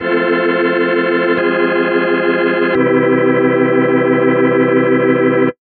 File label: MUSICLP; neuro; Stanza